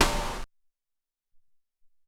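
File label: Hop Snare, Alesis SR-18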